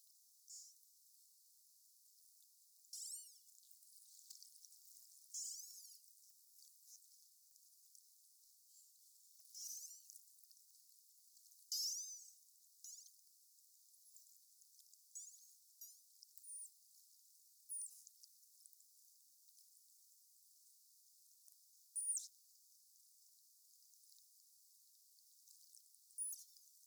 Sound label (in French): voc mix; globi